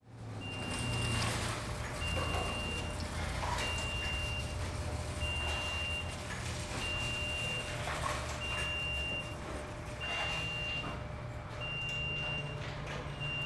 Ambience Grocery Store Entrance; AdobeCC

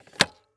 Electronic Machine CD Player Closing CD Deck; AdobeCC

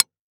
Weapon Axe Miners Pick Into Rock; AdobeCC

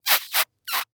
Cartoon Weird Noise Short Kissing Cheek

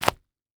Impact Wet Cloth Smacking Ground; Impacts

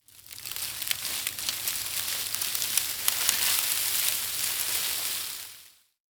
Movement Stick Dragged Through Leaves Debris; foley

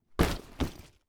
Sports Bag Duffle Bag Drop Cement Floor

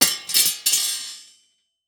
Impact Metal Bars Hit Cement Multiple Bounces; Impacts

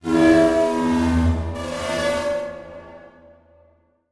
Transition Sweep Drone; Production Element

